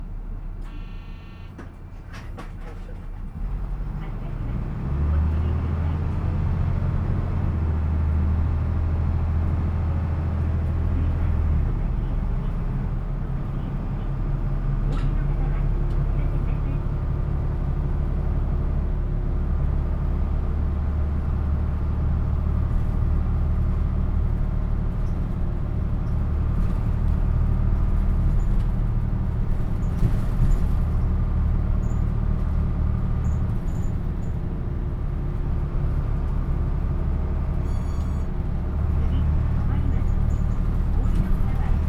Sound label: bus, nissan; nagoyashi; NMN-6